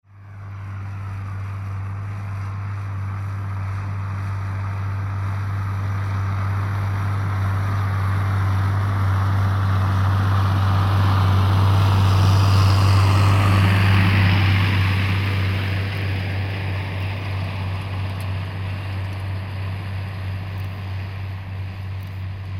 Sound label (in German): John Deere 7810, Motor: 6-Zylinder, Diesel; Leistung: 170 PS